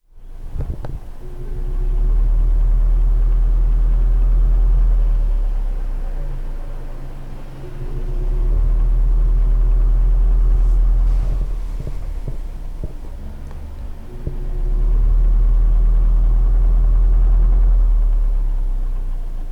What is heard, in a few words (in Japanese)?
senpuuki; Chino Cultural Complex